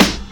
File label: KIT, Dance Mega Drums, HIP; Best Service